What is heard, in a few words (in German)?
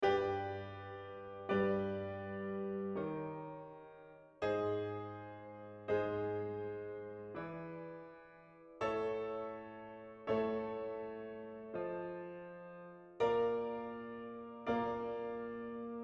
Einsingen Registerwechsel Glissando